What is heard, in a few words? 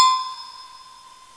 Ding